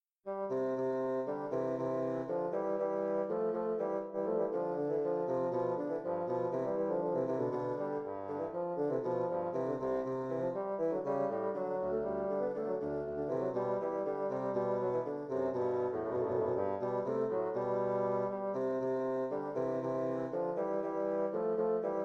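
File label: duet 2 bassoons; molter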